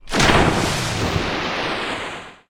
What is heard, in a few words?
Pirate Ship Emerge; Zelda